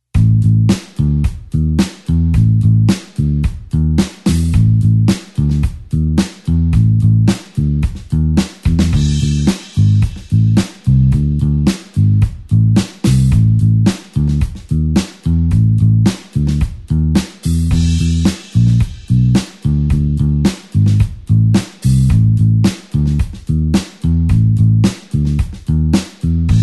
blues bassline 8beat A

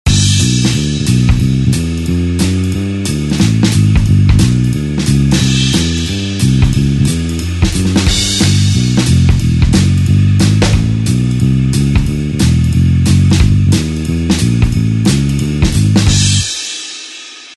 blues swing

